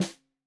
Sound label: Snare 65 Reg Stereo, Deeply Sampled Drums